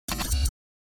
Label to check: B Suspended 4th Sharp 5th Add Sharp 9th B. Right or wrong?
Wrong